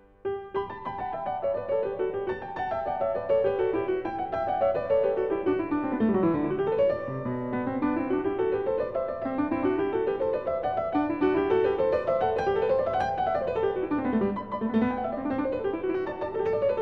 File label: Variatio 23 a 2 Clav., Piano; JS Bach - Goldberg Variations